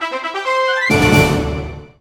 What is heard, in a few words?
JINGLE LVUP; The Magic Nightmare; android ios mobile gamerip 2017; WHITE JINGLE